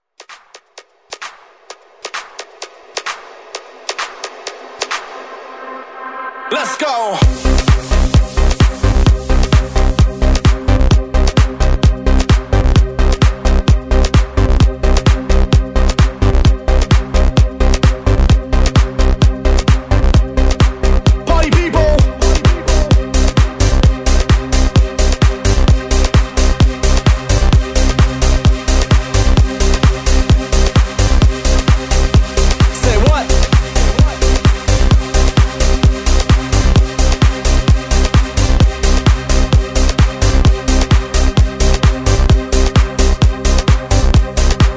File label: 128 BREAKMIX; DJDOLLS